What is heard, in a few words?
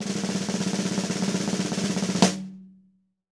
roll, drum